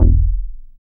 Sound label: BAS MKS-50, Roland; Sound & Vision - Gigapack II CD 1